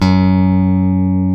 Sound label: BASSES, Roland